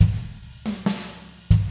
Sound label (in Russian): barabani